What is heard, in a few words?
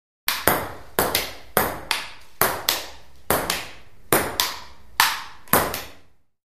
Ping Pong Ball Hit